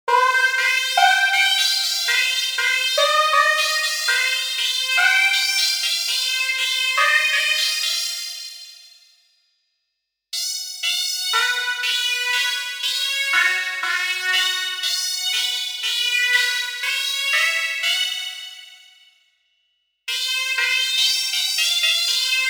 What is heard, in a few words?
Arturia M12V Matrix Pad MW HPF Energy Stab 120bpm C